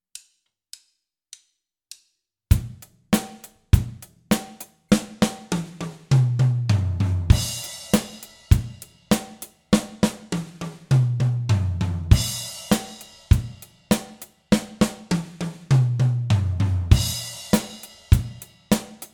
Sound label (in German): 1 taktige fills